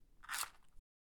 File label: Crunch Noise